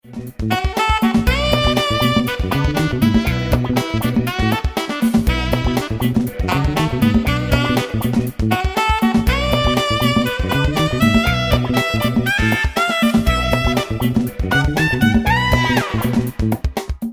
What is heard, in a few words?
Funk Alto Saxz Met